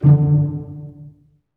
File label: STR SEC, ORCHESTRA; Roland - LA Composer vol. 1